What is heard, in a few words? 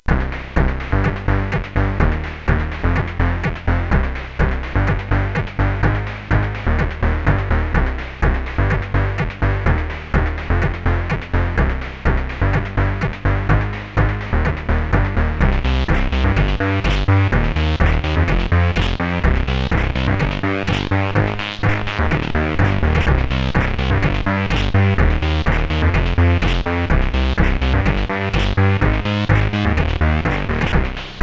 Subbass, sid CSG8580R5